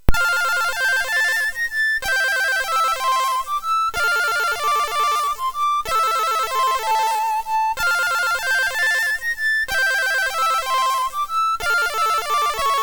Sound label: sid MOS6581R3; Crown